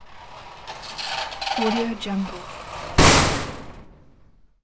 creepy derelict heavy metal doors close; domestic sounds

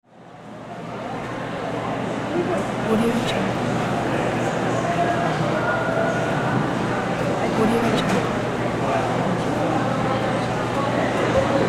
busy indoor market

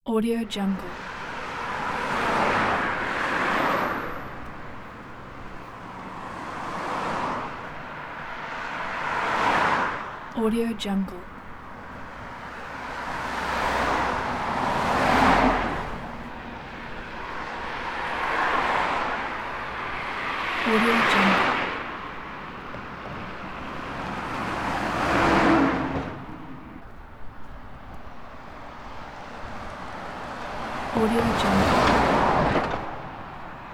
traffic on country road; urban sounds

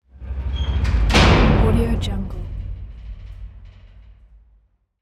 jail door closing

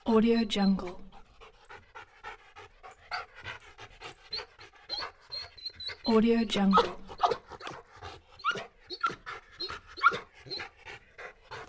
rottweiler dog whining; نفس نفس زدن سگ

